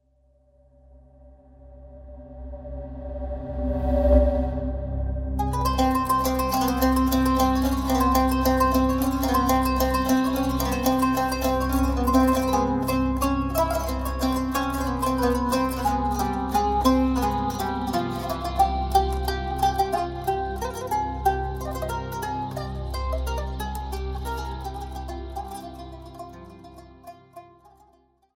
Lullaby; trance oriental from egypt

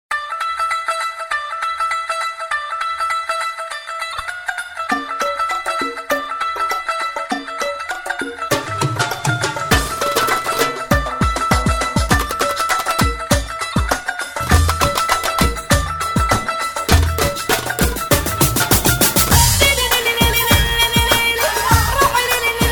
Moled Al Jazz

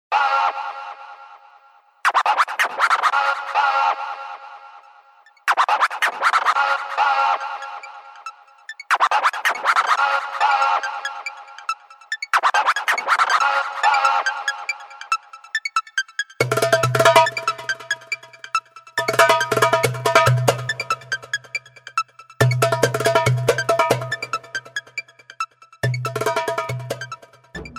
Rolat Al Techno, oriental belly dance

